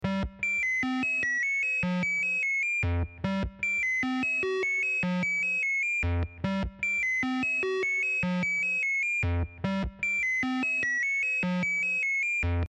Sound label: techno